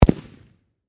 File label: Firework Explode, Bang; Fireworks